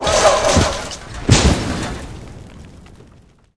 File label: death giant dead, monster